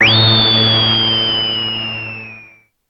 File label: Unused Sound